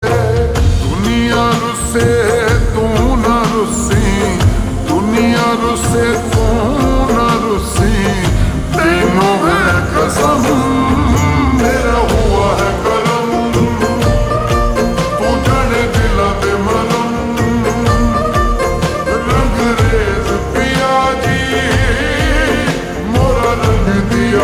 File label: Ringtone slowed and reverb